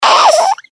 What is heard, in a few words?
baby snorts